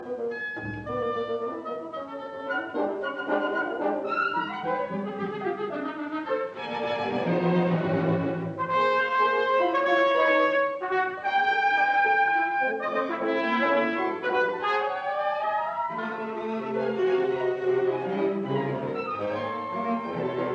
Violin Concerto Finale Allegro; Schoenberg; Op 36

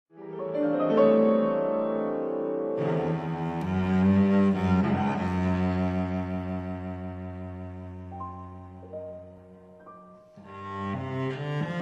Sonata for cello piano Recitative

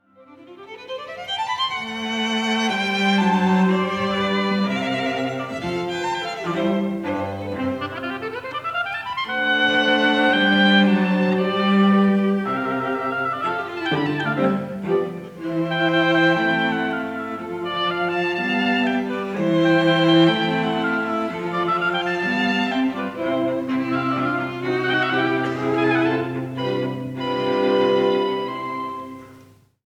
Oboe Quartet in F Allegro; Mozart

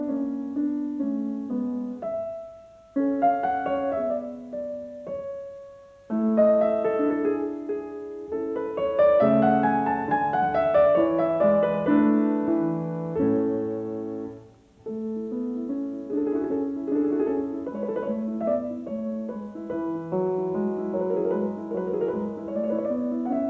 Piano Sonata in D major; II Rondeau en Polonaise; Andante; Piano Sonata No 6; K 284; Lili Kraus

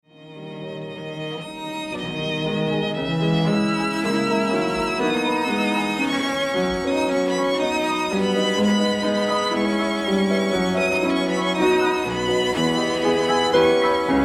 Trio in B Op. 8 I. Allegro con brio; Brahms

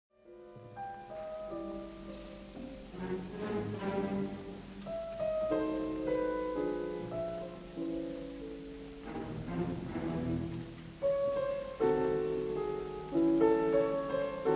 Symphonic Variations; Franck; Concertgebouw Orchestra, Amsterdarm

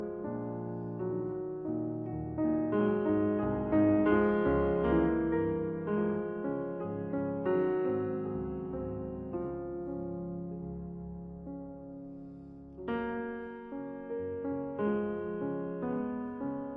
Romanzen in F sharp major; Op 28 No 2; Juana Zayas

